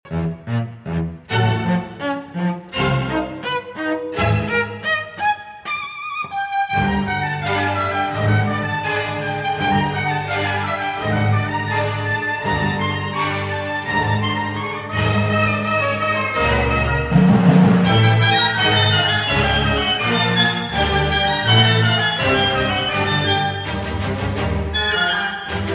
Cello Concerto No. 1 in E-Flat Major, Allegro Non Troppo